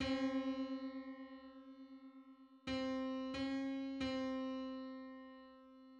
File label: Thirty first subharmonic on C